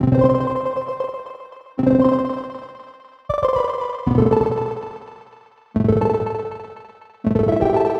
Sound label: 120 C Rolling keys, Arps; Loops; Touch Loops; BlissedOutBeats